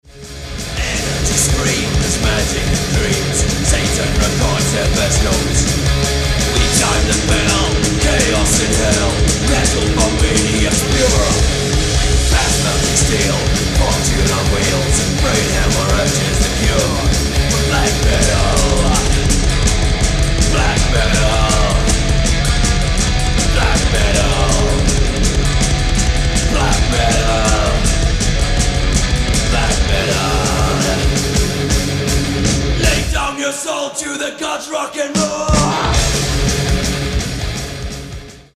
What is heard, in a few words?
Black Metal